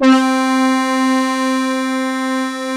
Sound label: ARP, Analog Fantasies; BIG RASS; USB Soundscan vol.49; AKAI